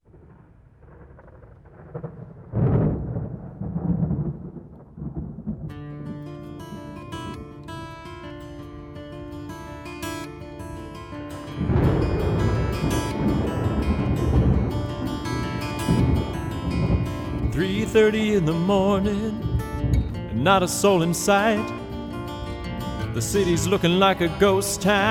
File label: karaoke